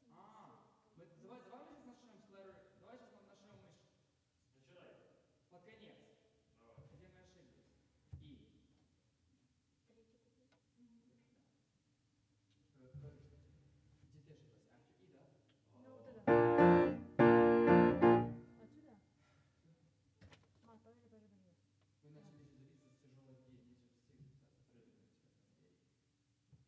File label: Piano